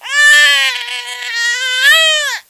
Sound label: Sfx Minigame Error Painful